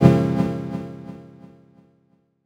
wavy brazilian funk echoing chord, 170bpm, C# minor